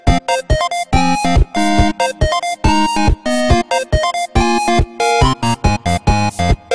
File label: chip